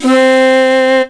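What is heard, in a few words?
C3 SHAKUHACHI; roland1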